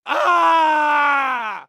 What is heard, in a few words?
k o scream; mk9 kenshi